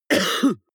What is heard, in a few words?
cough male single attenuated; koerpergeraeusche